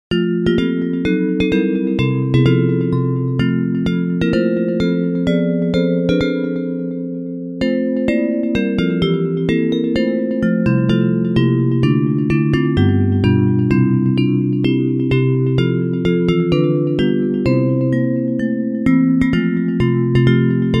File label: Bells